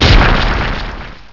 Weather Ball; pokerogue; battle anims

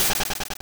flee

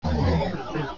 Scary Face; pokerogue; battle anims

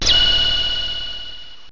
Sweet Kiss; pokerogue; battle anims

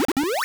restore; pokerogue

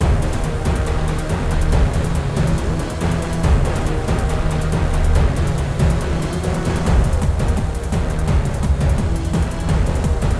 battle legendary; pokerogue; bgm; glas spec

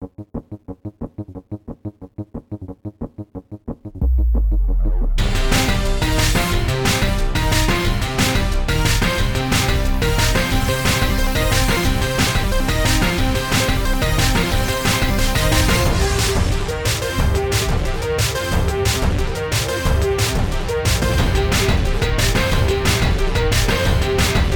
battle legendary; pokerogue; bgm; sol lun

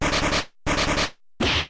Flail; pokerogue; battle anims

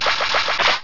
Water Shurkein; pokerogue; battle anims

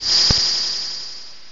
Smart Strike; pokerogue; battle anims

